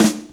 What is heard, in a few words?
SD, dance drums hip